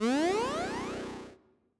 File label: Shot Charging